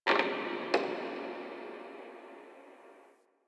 Wooden Creak Small

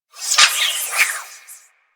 Gas City Wire Whip; Ambience; Warframe; Corpus Gas City

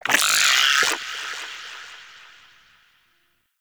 Vocal Pain, Shrimp Lantern, Creatures; Baliq

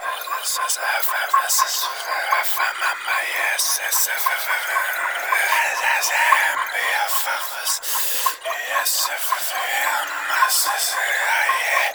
Medusa Puzzle Orb Lock Idle Loop; Warframe; Duviri Murmur

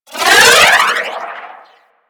Arch Wing Prime Dodge Roll; Movement; Warframe; Vehicles

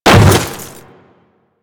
Hammer Boss Land Ice, Footsteps; Warframe; Grineer

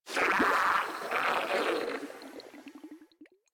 Frogman Death, Vocals; Warframe; Grineer

